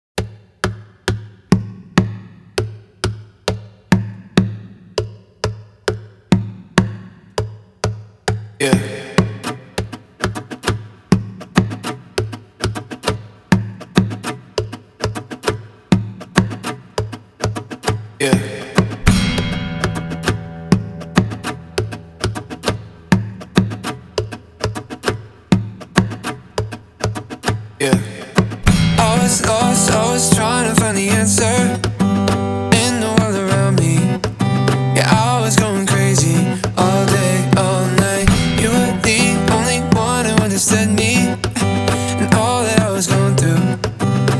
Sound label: acoustic remix